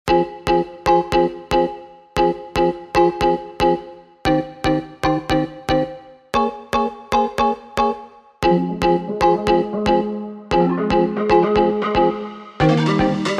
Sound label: marimba remix